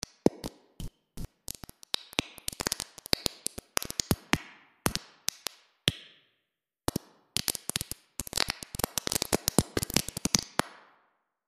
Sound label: Large room bright, reverbs; .mp3 file - 100% wet; freeverb